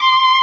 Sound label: violin